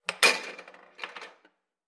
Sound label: air hockey goal; arcade